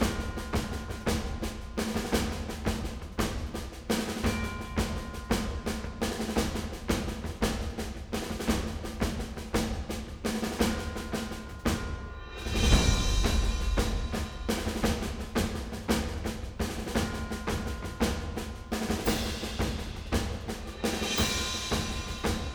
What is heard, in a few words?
Battle Theme Loop